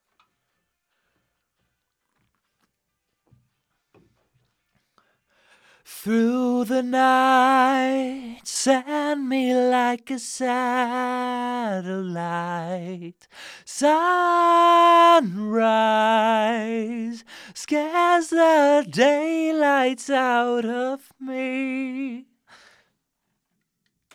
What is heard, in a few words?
vox; logic